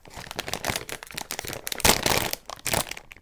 opening a crisps bag; eating

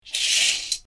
curtain

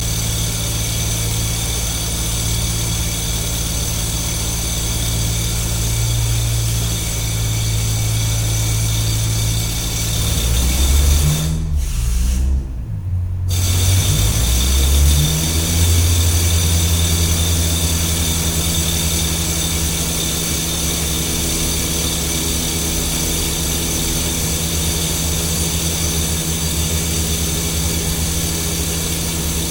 site of construction

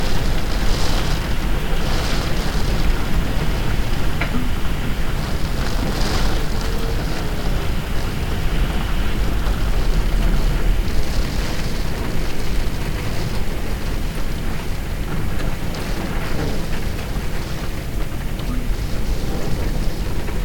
storm and window; nature

